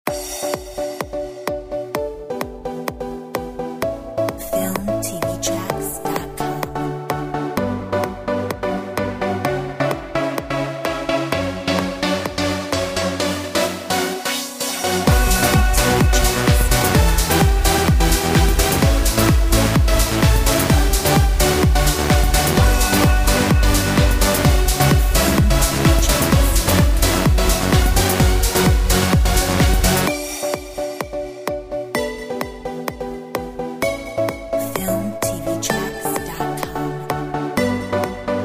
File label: Euro Dance Hit